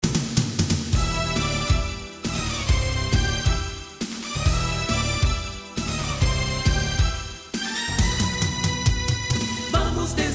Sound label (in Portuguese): Abertura; Dragon Ball